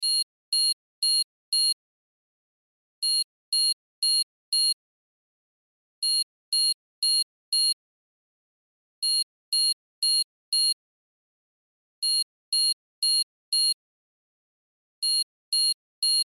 Cell Alarm Chime Loop; Electronics; Alarms; Blastwave

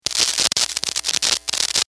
sparks, Electric Spark; Sustained Static , From Guitar Cable

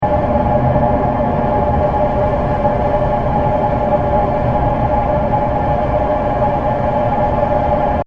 Dark Space Atmosphere 120bpm; Sound Design

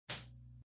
Ping; Pings; multimedia

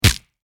Impact Punch Face Hard Crunch; Punches and Hits; Combat

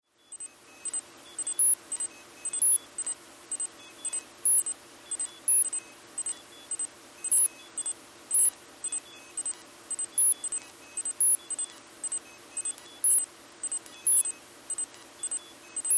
Emergency Surveillance Truck Interior; Emergency Vehicles

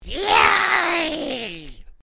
Created Creature Small Call, Alien Sound Effects

